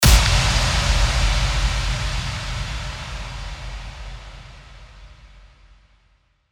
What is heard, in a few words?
IMPACT